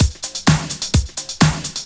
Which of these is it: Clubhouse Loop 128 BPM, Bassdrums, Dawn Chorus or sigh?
Clubhouse Loop 128 BPM